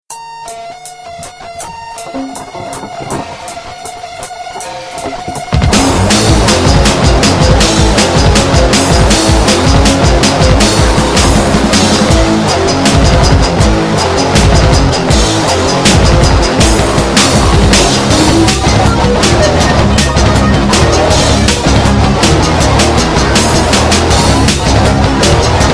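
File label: Refinery Fight; Painkiller Redemption; Windows gamerip 2011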